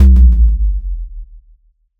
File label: boom